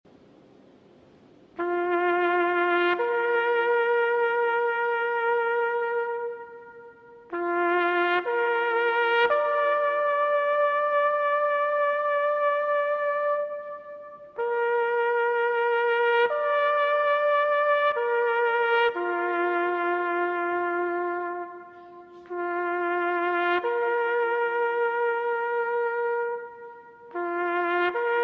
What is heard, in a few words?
Il Silenzio Trumpet Solo